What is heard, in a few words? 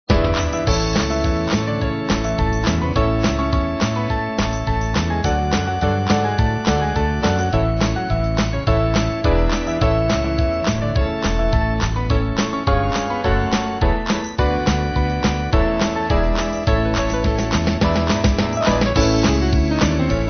Band; From The Very Depths; Battle Hymn